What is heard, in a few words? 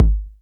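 bass drum 808 Click Color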